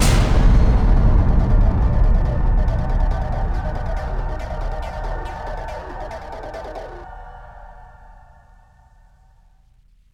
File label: Bomb Timer; Unreal II The Awakening; Acheron